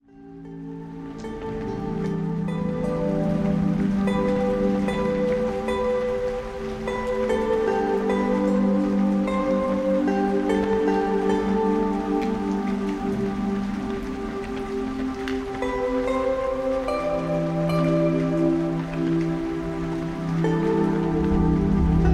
zvuki prirody

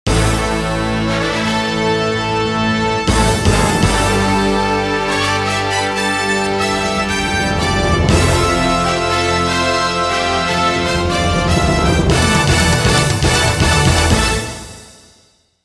fanfary vstupleniye; zvuki k osennim prazdnikam; zvukovoye soprovozhdeniye

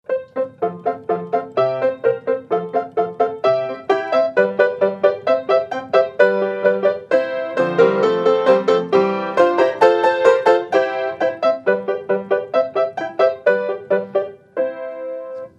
pruzhinka pod rus nar melodiyu

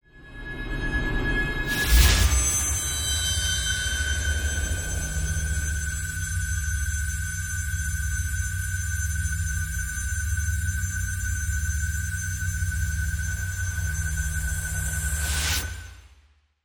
magicheskii effekt zhuzhzhalka; audio effekty dlya prazdnika

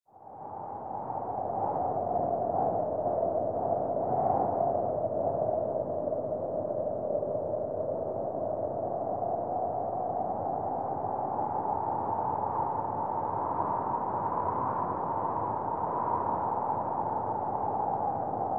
audio effekt metel; prirodnyye zvuki zimy zimniye audioeffekty

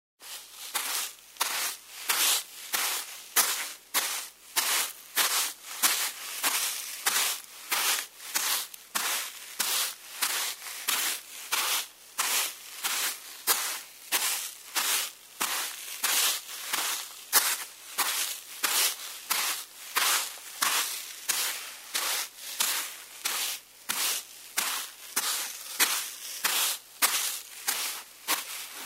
shagi po pesku; den neptuna; zvuki dlya det skogo prazdnika

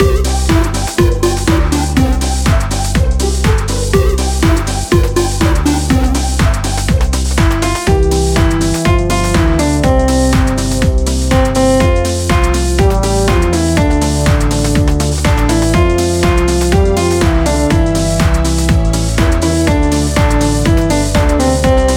den rozhdenya karaoke

